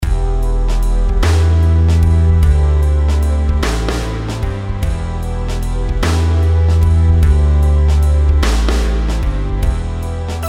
rhythms compound 8s

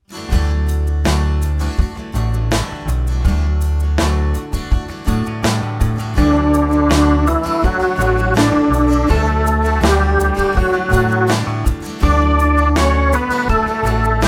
melodic dictation

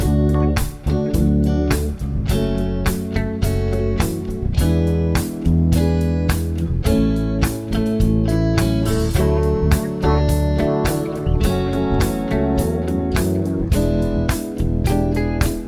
progs with applied chords; harmony

